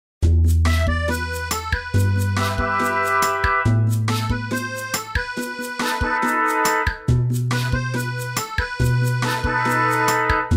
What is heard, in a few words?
Night in Brazil instrumental